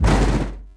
metal crunch med; objects; Star Wars Obi-Wan; XBOX

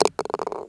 ball hole fast, golf; ProStroke Golf World Tour 2007

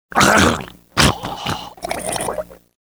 throat, pain death, male; Soldier Of Fortune II Double Helix; XBOX

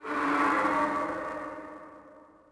howl echo; tusken; Star Wars Obi-Wan; XBOX

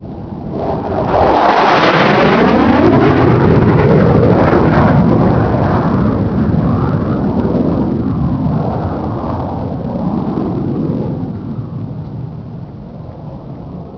Jet Flypast; Chase Hollywood Stunt Driver; XBOX

